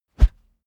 whoosh wood cornered whizz bass

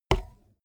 bmx bike tire kick

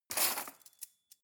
cutlery cake forks drawer grab hard

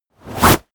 wipe

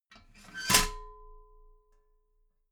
metal ladder small handle fold heavy

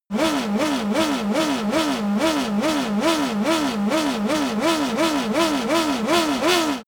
racing car f1 engine rev sequence

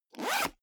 zipper backpack open fast

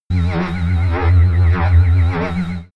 alienation

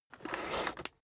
vacuum cable drag out single

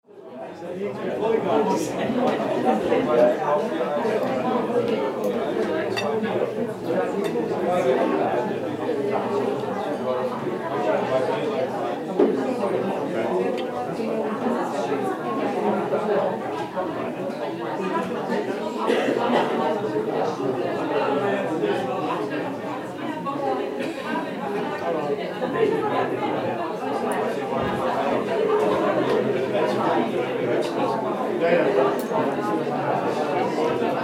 cafe

